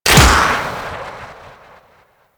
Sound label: Dart Pistol Shot; Infested Dart Pistol; Warframe; DE; Weapons